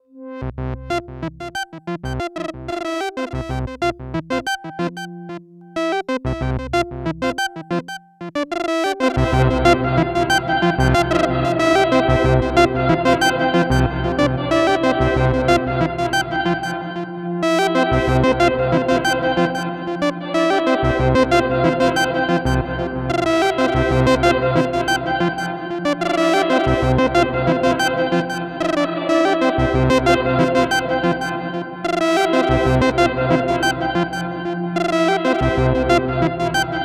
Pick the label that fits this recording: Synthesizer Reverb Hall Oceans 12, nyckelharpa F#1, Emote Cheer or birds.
Synthesizer Reverb Hall Oceans 12